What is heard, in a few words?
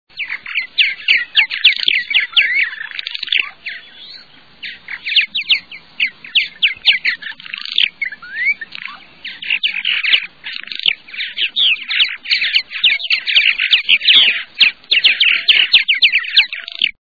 Purple Martin, songs